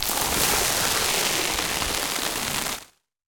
liquid texture; fvtt sonniss gdc audio

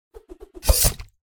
spear, weapon attacks; psfx

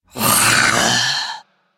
creature hiss; sonniss gdc

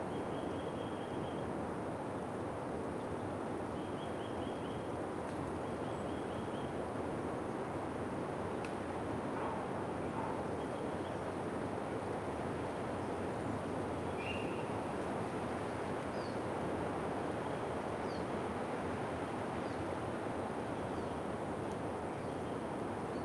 ambience birds forest quiet wind loop; sonniss gdc

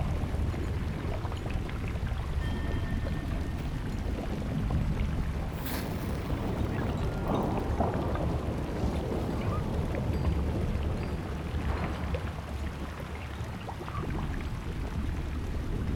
Water Shrine In Thunderous Volcanic Field; side quest society

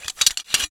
steampunk mechanical mechanism metal; bluezone; sonniss gdc

